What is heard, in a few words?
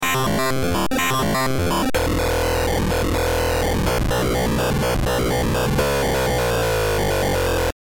Synth, Rate Switch; Metalthrix; QiTaNo; 8 Rateswitch